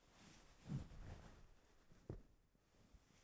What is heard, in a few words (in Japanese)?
布ズレ; 効果音